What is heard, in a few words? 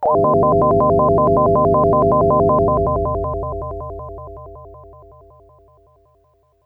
SYNTH UFO wavez Chord